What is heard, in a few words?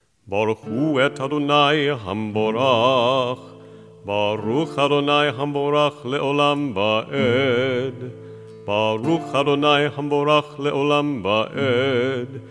Torah Blessings chanted